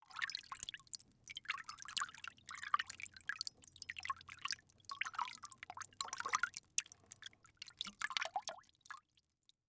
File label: Liquid Water Movement In Metal Bowl Finger Swirling Easy